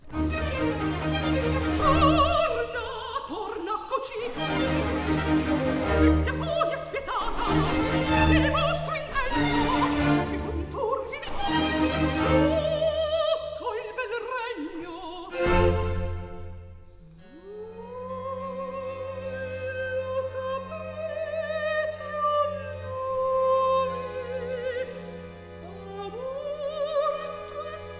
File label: cantata, Recit; Questo è il piano; Torna, torna a Cocito; Stabat Mater: A Tribute to Pergolesi